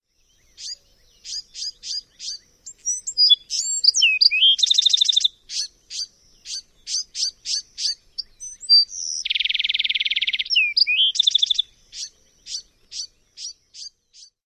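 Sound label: Bewick's Wren song and call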